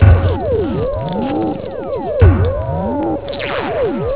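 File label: scream mono; tron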